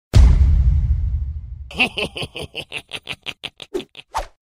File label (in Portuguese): risadinha; stopots; meme